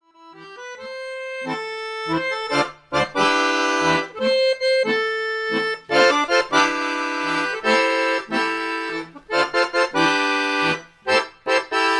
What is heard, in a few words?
slavic accordion